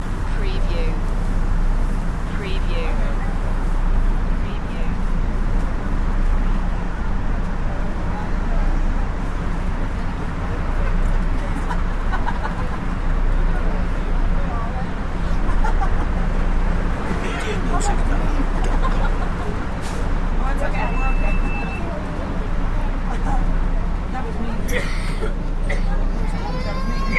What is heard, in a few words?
Electric Train Interior, Medium Constant Walla